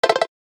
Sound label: hurry; standard ringtones